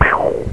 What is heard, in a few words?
pow, cartoon sfx